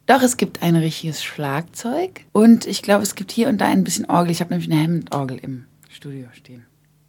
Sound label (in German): richtige Instrumente